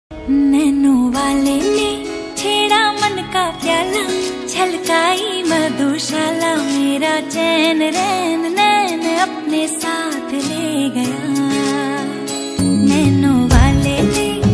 Romantic Hindi Ringtone

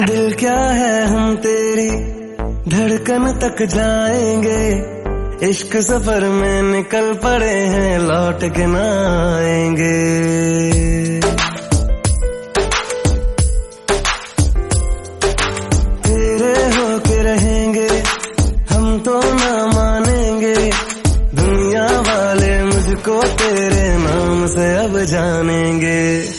Ringtone

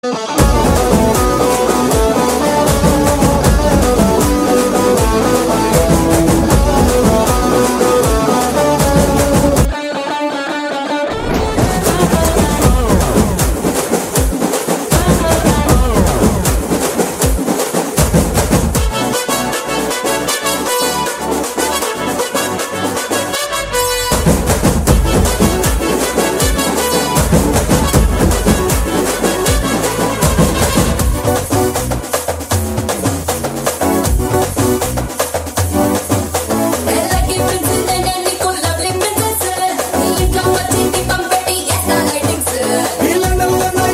Hindi Ringtone

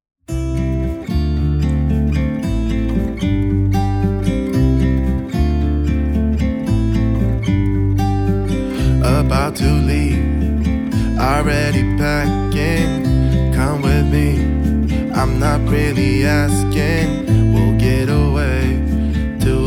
Acoustic Version Ringtone

